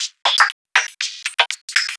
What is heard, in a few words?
Percussion, Trance NRG, Drums